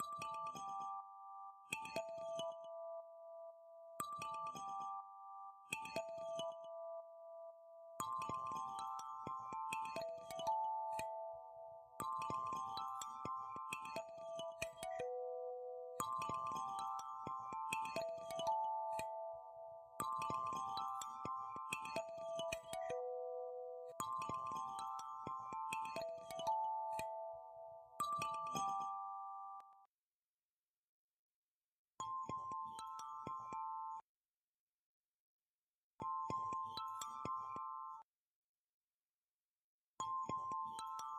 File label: Underscore Thumb Piano FX